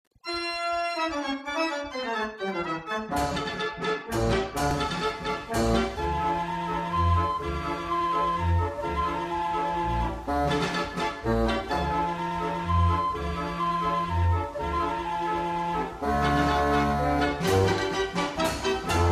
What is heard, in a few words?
Belgian, belgian band organ